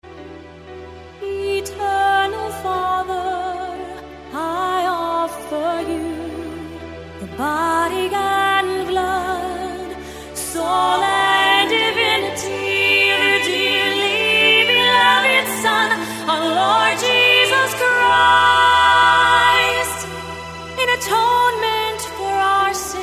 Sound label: The Sung Chaplet; divine mercy prayers